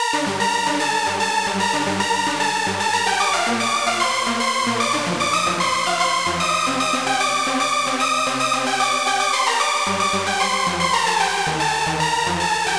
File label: lead scream; 150BPM